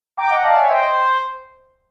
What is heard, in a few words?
Cartoon Failure Horn